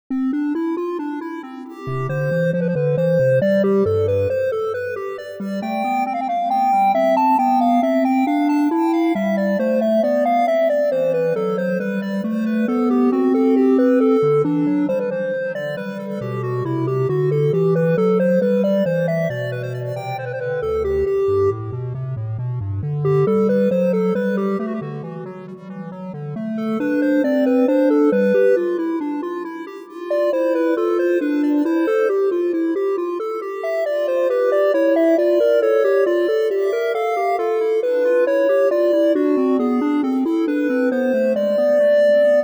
bwv 772 for synths

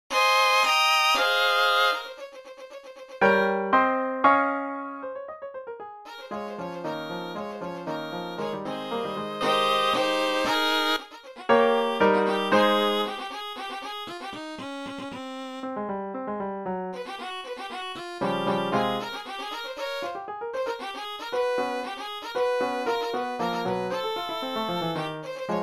Violin; Short Piece No.8 in A-flat major; Li, Cheng-Shiun

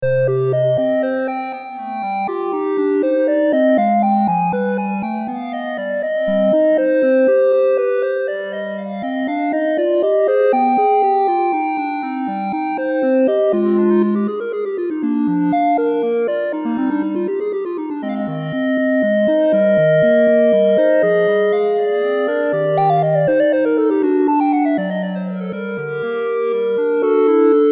for synths; 15 Sinfonias, BWV 787-801; Bach, Johann Sebastian

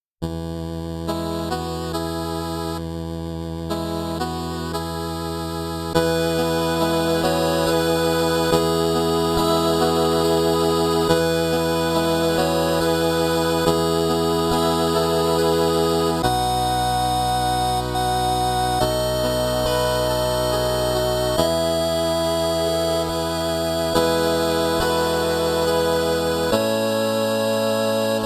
Silent Night 8-Bit Version; Stille Nacht, heilige Nacht, H.145; Gruber, Franz Xaver

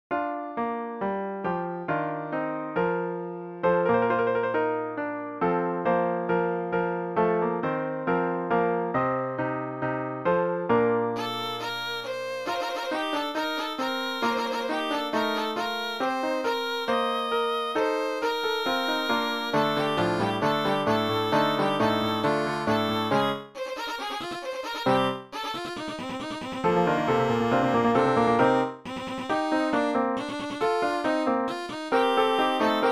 Violin; Short Piece No.7 in B-flat major; Li, Cheng-Shiun

